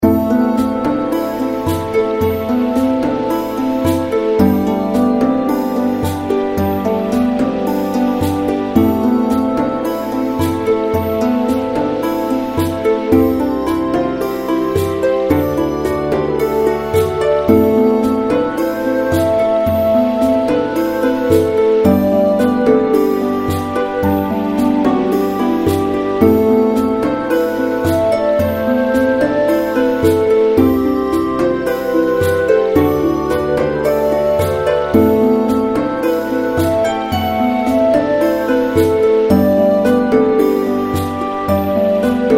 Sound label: reelspin bg; Stonehenge